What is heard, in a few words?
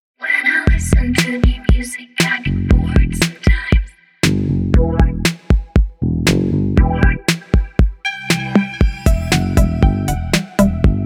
80s drum machine